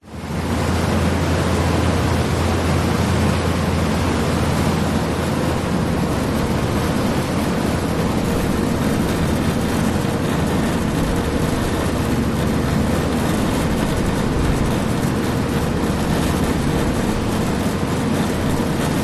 boat ship car ferry car deck amb engines vibrations waves wake; vehicles; zapsplat